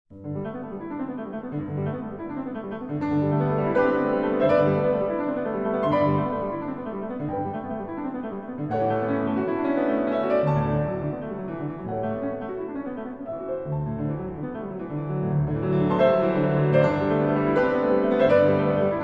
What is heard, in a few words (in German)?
preludium g dur; chopin; op 28 nr 3